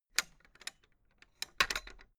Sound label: fence gate latch rattle hard; objekte; tueren